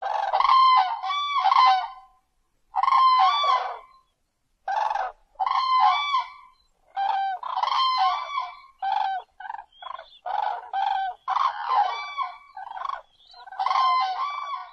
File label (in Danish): gase lyder